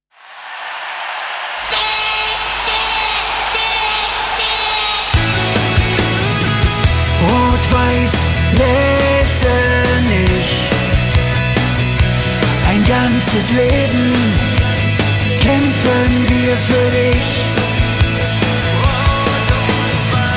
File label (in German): Telefonjingle; FC Rot-Weiss Lessenich